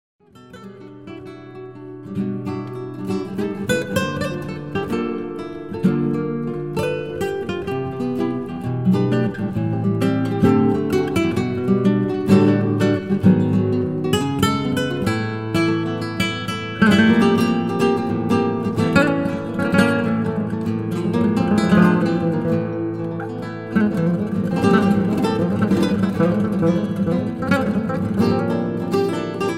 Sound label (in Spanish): vals rasgueado